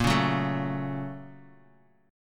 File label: Bb Minor Major 7th Sharp 5th, Bass Standard; BbmM7#5; strummed; 18,16,16,14; JGuitar